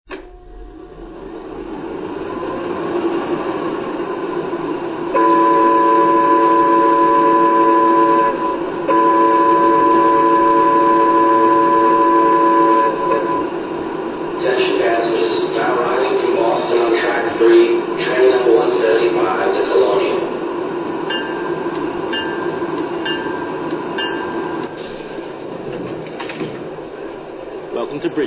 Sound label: EP5